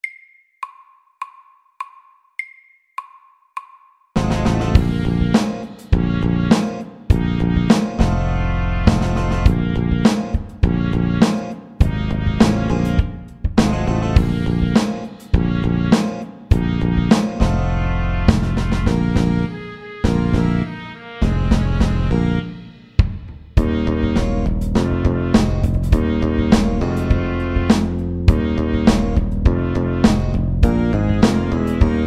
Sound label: Funky, 102